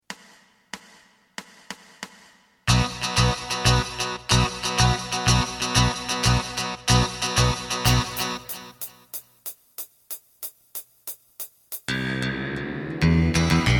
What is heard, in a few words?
drum